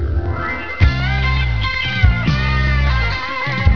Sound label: piano